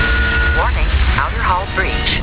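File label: hull breach; monstmedia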